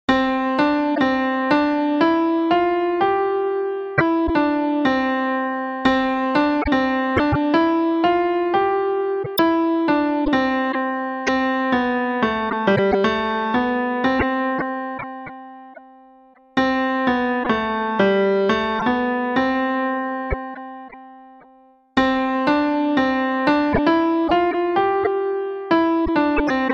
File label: piano